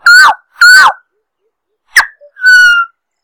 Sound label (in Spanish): boyero pico de hueso